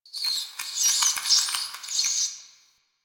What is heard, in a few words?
Town Street Critter, Ambience; Warframe; Duviri